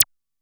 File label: TECHNO PERCS; Crypton - DrumBase5000